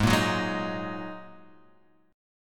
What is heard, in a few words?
Ab 7th Suspended 2nd, chord